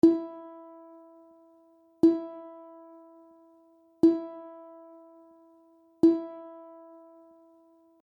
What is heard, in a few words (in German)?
ukulele stimmton e; ukulele lernen fur anfanger